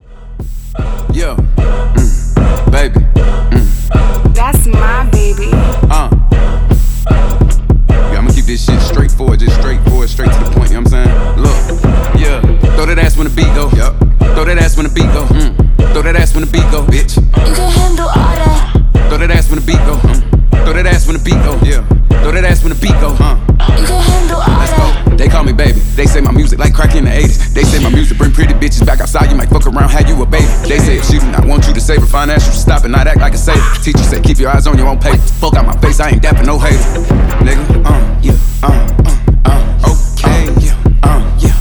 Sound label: beat